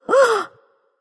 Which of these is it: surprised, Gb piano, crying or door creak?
surprised